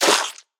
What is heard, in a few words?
Flycaller Damage